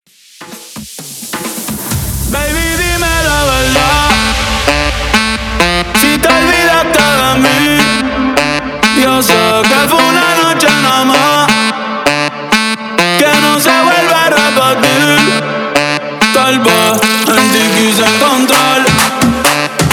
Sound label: 130 BPM